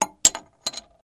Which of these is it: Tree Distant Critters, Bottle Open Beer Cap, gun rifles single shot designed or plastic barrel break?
Bottle Open Beer Cap